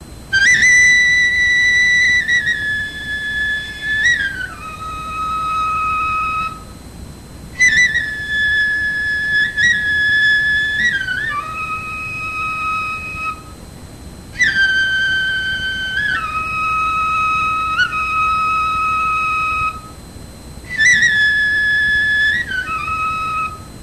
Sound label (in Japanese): fue mikoshi; geinou; furyu; mouri